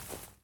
grass step; footsteps

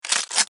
cz600 switch; weapons

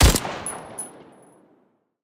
saf 200 fire, weapons

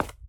stone step; footsteps

